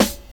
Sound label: Dry Acoustic Snare F# Key, steel snare drum sample tuned to the F# note